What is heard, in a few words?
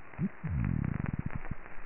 Red Hind